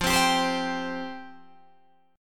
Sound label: F Suspended 2nd F Guitar Open D